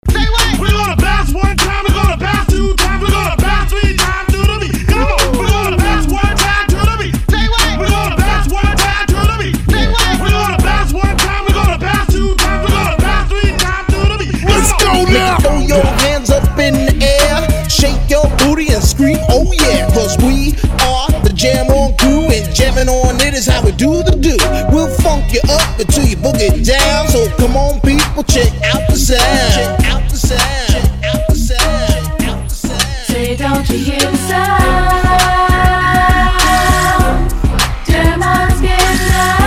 Classic Hip Hop

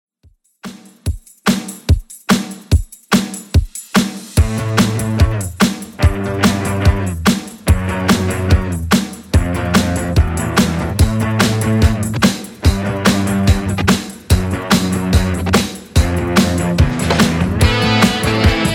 70s Rock Redrum 145 bpm

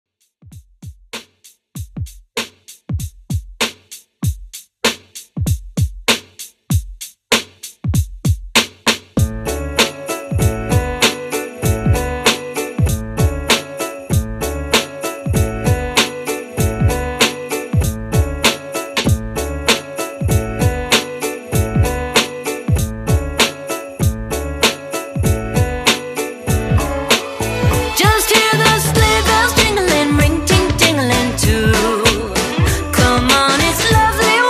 Xmas Redrum 97 BPM

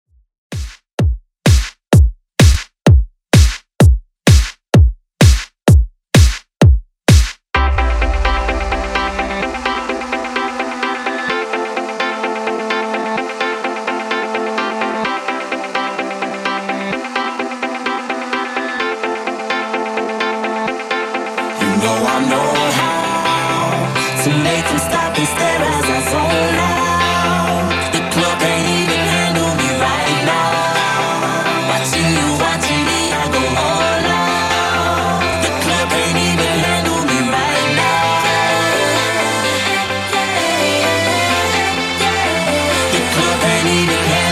128Bpm

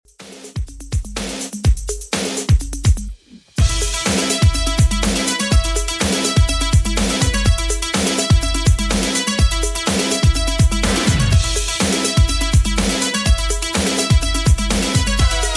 80s Redrum 124bpm

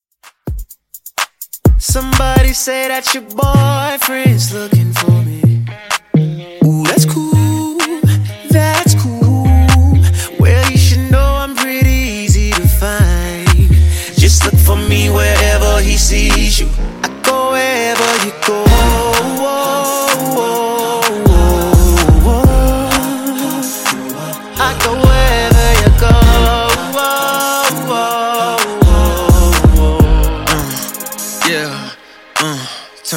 127Bpm; Dj Intro Outro Clean

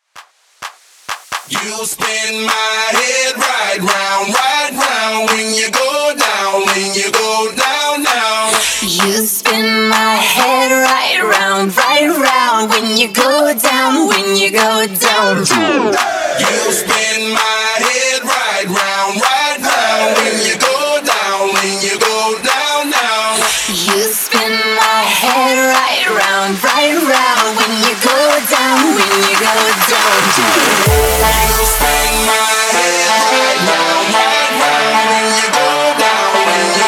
Acapella In Basshouse 129 Bpm

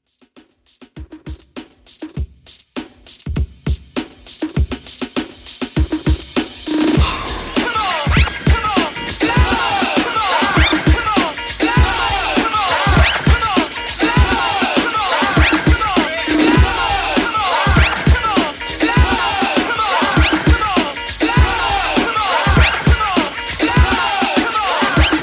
80s HipHop Redrum 100Bpm Clean

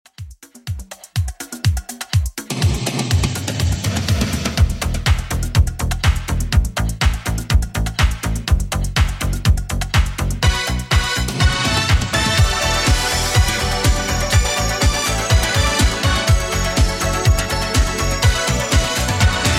80s Redrum, 123 bpm

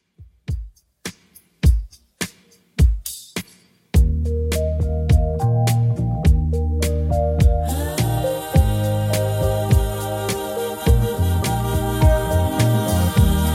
70s Redrum 104bpm

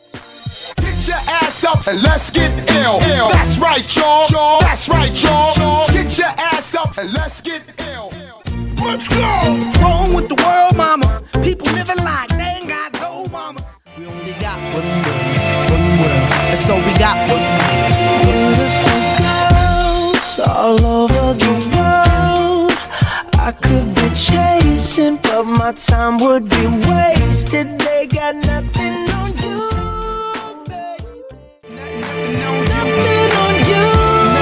Mashup Megamix 94 Clean

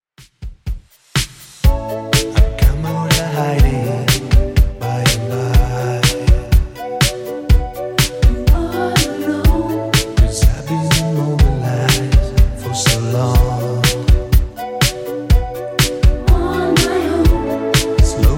80s Redrum 123bpm

